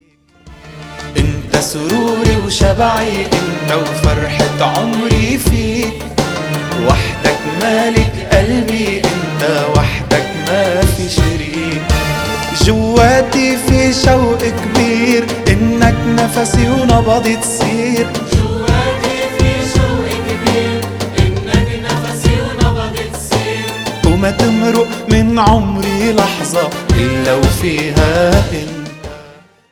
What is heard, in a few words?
Ringtone